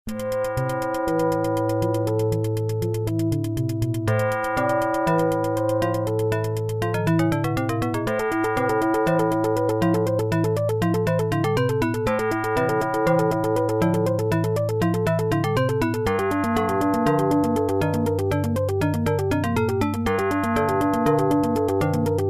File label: music; Jill of the Jungle; Stealth